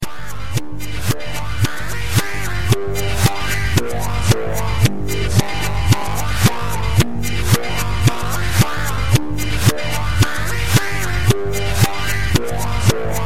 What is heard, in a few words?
Funk Ad